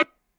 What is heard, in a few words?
Perc Hi Speaker, Stylophone Beatbox